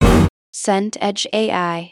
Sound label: stinger hit